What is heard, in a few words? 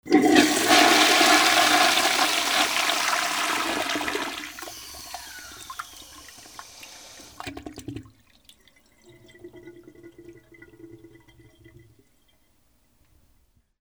BATHROOM